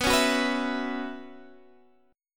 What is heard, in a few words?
B Suspended 2nd Suspended 4th, Guitar, Half Step Down